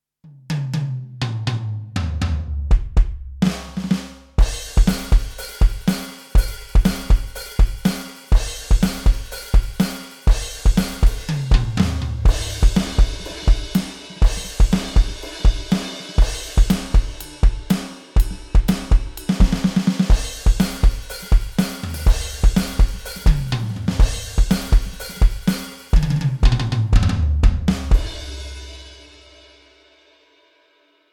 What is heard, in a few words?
Rock Star; ROLAND TD-02KV